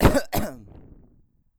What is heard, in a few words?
Fake Cough